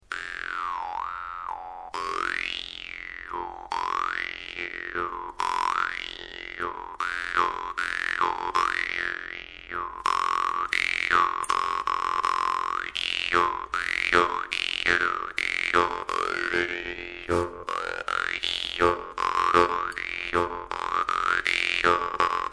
parmupilli F, Parmupill bass; madal

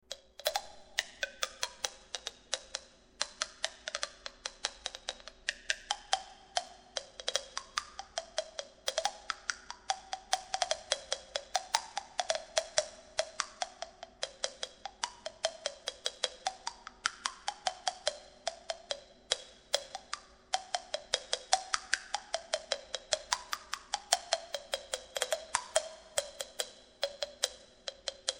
clackmoor, vargan; dan moi mo nhip

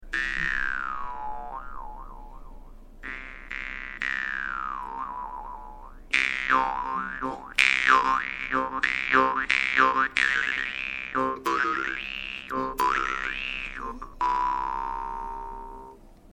doromb baykal, vargan

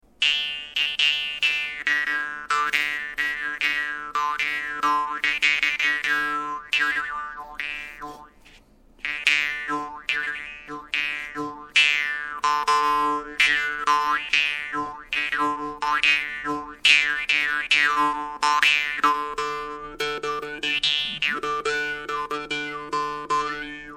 yakut khomus small, vargan; yakut khomus xristoforov

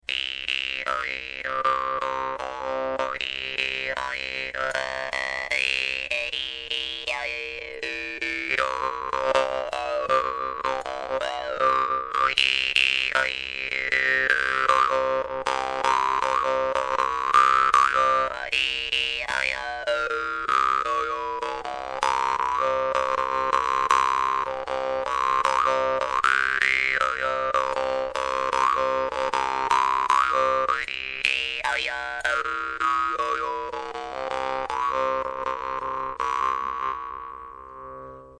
doromb; hungry doromb Kandahar; vargan

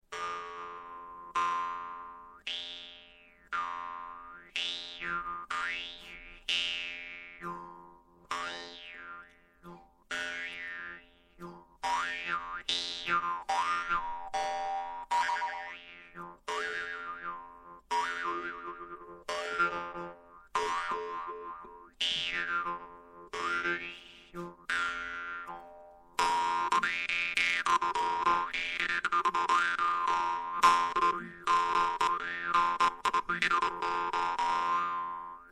altai komus

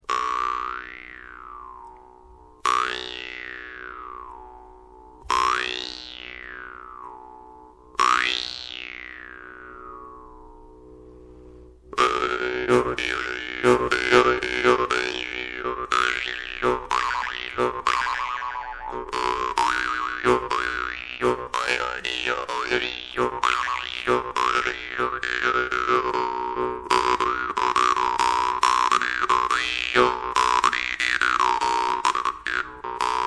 parmupilli B, parmupill middle, vargan

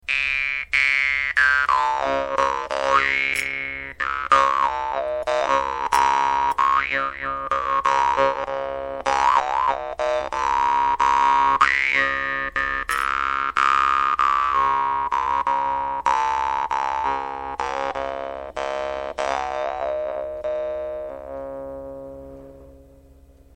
doromb; hungry doromb Bendeguz; vargan

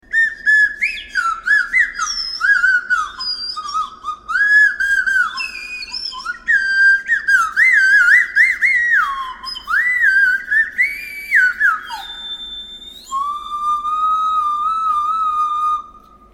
wind lotus flute aluminium big

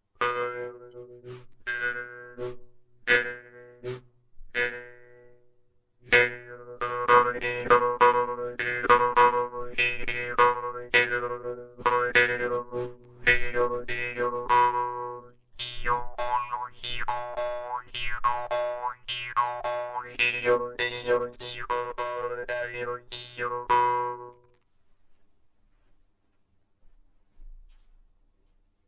mar4anga brass, vargan

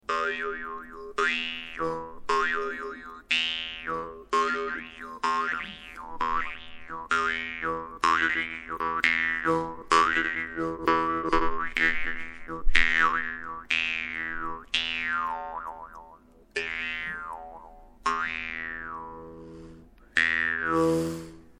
khomus, yakut khomus; gogolev; vargan; kolco

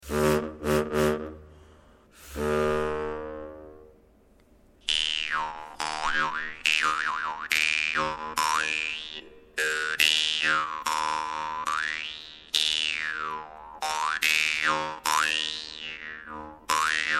klyk, vargan; kokov

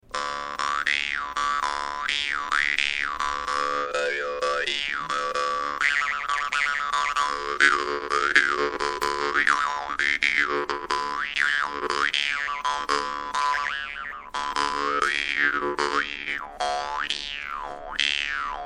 ppotkin big, vargan; altai komus

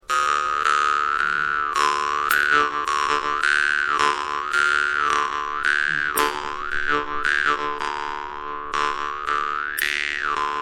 parmupill middle, vargan

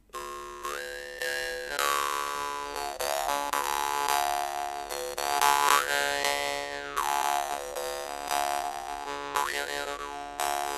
dan moi bas, vargan

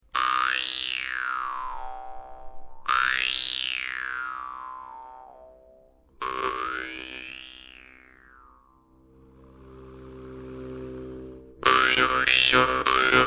javoronok lark, vargan; uvarov

